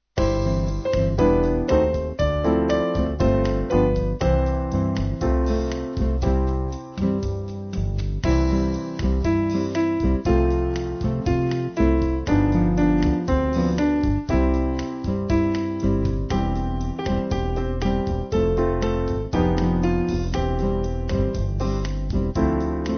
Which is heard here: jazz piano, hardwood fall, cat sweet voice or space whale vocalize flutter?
jazz piano